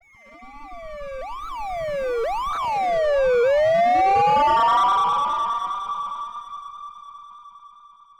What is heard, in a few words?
time machine; musicbox